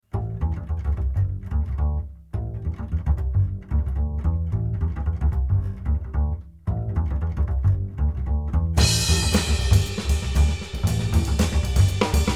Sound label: bass and drums only, funky bass ostinato; tacet for the intro; play the melody; solo four choruses; play E (2 times); play the out melody